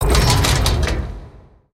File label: UI Gate Open End; BTD6